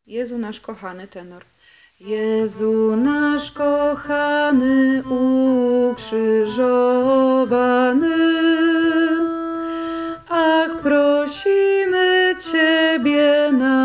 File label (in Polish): Tenor; Jezu nasz kochany; Wielki Post